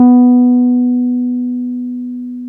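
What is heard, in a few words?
piano, Wurly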